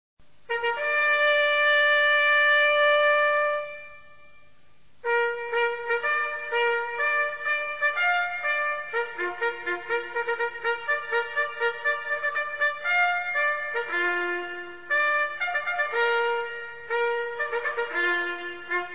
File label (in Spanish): Retreta, TOQUES DE CORNETA